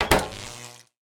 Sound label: electric network close; factorio 2.0.55